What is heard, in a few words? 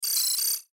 Flash Animation Multimedia